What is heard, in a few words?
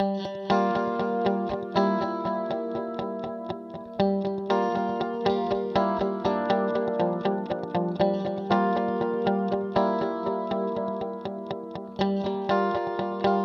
Guitar Sony HR MP5 Ripple Magic Vintage Digital